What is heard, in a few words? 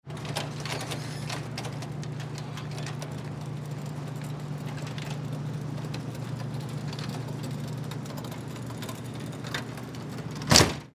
Industry Cargo Door Close Automatic Garage; Garage Doors; SFX Bible 6